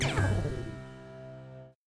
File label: game lose effect